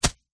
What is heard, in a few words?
foley grass object fall land soft impact